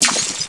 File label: Laser Crystal